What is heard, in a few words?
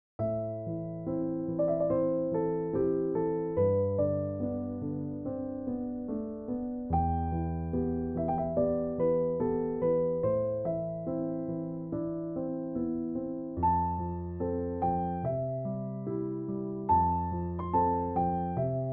piano solo